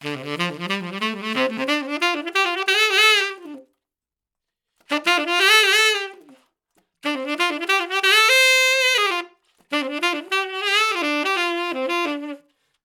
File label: 90bpm Triplets Repeated 5 Bars